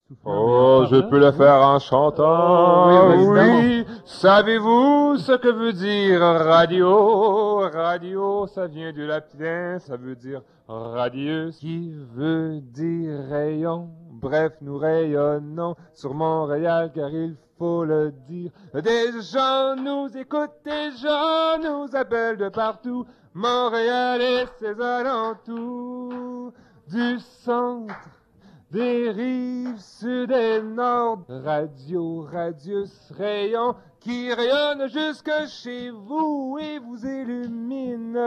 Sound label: Jazz chant; Chanson improvisée lors d’un radiothon à CIBL